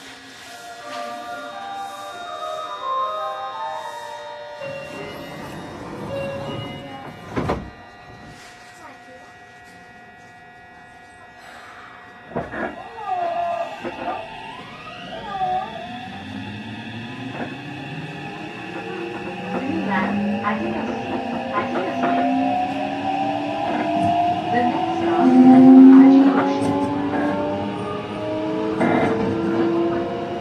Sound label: vvvf, mitsubishi; meitetsu 300